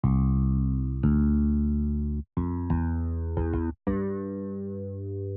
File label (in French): compo msm basse